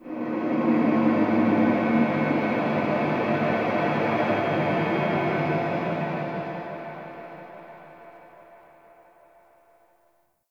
Energy Distant Texture Large, Corpus Ship ambience; Textures